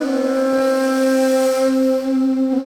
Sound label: SIERRA FLU